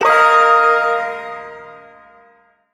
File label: Shawzin Blues Chord